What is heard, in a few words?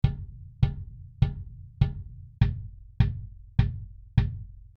d112 tama